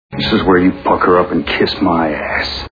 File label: pucker up